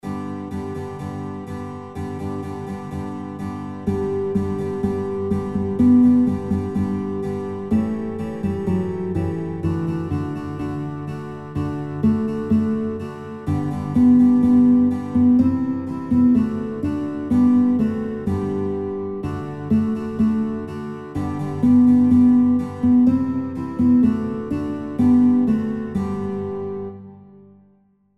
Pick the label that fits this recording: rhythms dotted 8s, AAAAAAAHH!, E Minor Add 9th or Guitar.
Guitar